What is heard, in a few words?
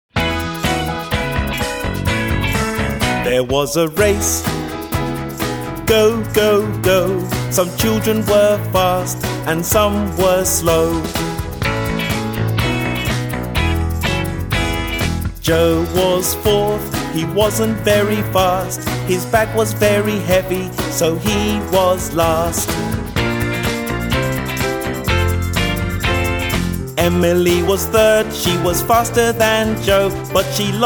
karaoke